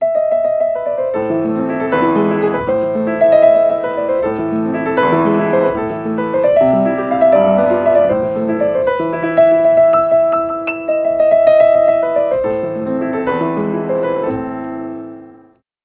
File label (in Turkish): bekletme muzigi piyano